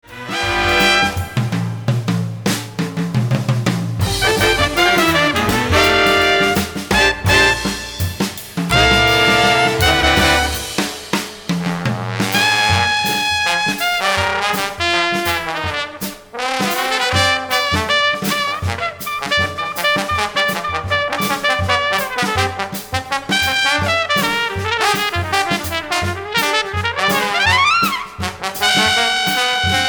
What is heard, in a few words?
trombone